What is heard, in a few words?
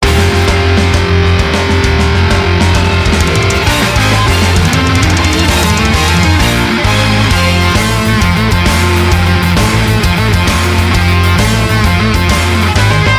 Music